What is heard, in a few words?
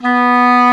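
english horn; E-MU Formula 4000 Series Vol. 5; Protozoa; Proteus2 Presets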